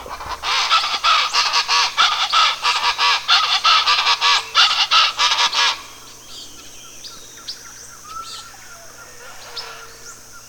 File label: chachalaca, Belize birds birdsongs